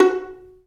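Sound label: Vio pizzicato F#3; Digital Sound Factory Virtuoso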